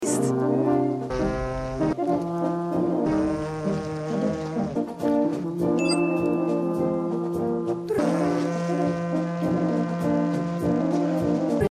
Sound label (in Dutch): Alpenhoorn